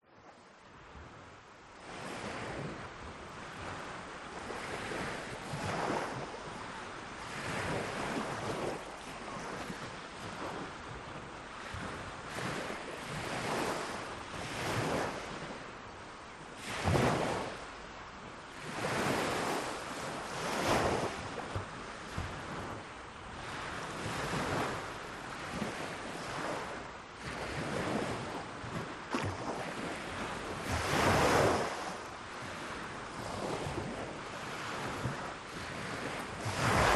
Morning Close Sea Waves; west wolf

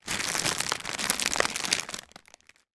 foley packet pork pie empty movements

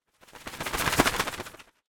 animals bird small fly past wings flap; zapsplat

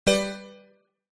game sound synth stab warm bright alert

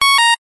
technology electronic device beep tone error

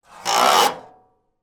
foley metal grill scrape; zapsplat

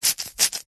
foley wet cloth squeeze dab squelch

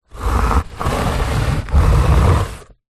foley cardboard box small drag carpet

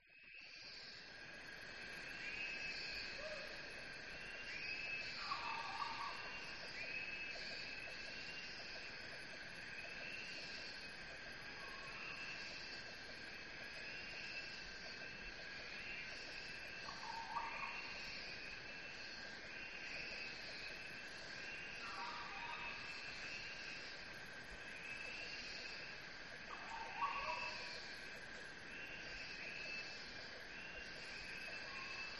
Jungle Asia; audio hero

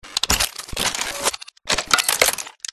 MECHANICAL MORPHS; PMSFX

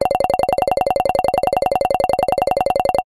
game tone toggle scroll

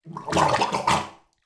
human choke bath water

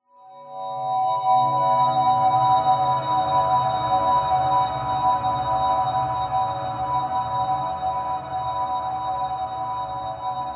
horror swell long ghostly discordant; zapsplat

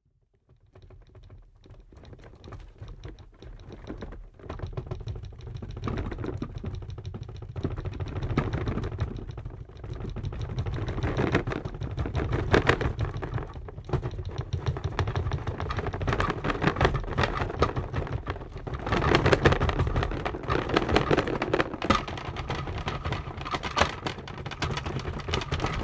household wooden chest shake rattle earthquake

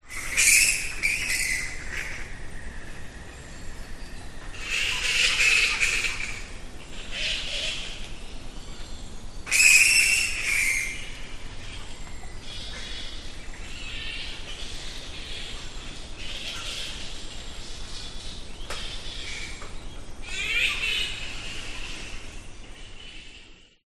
bats flying foxes in trees evening australia; animals; mary caincross reserve

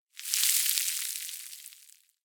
foley cigarette or cigar burn; zapsplat

